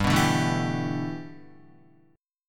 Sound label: G 6th Add 9th G Guitar, chord; G6add9 strummed